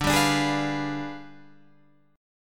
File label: G 7th Flat 9th